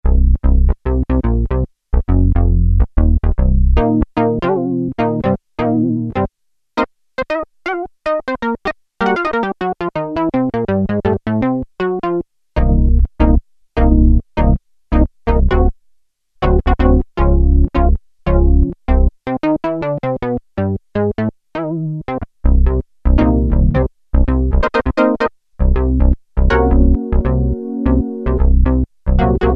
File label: Funky, Roland Juno-106; Factory Preset